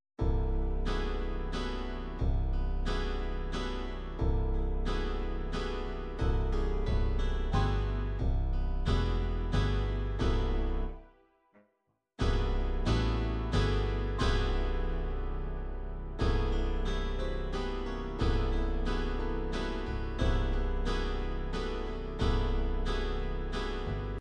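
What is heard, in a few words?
country waltz, midi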